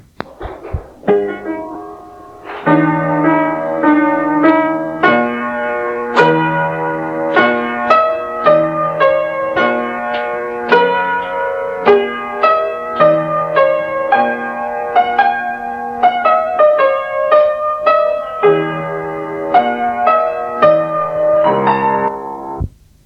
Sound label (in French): Avec un piano